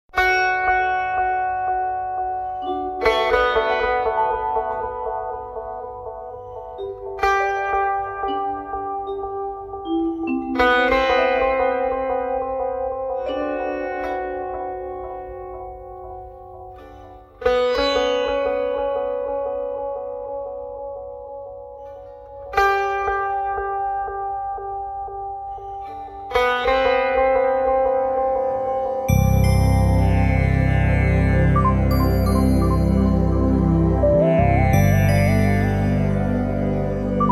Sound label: Solitaire Blues